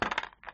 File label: rack, pool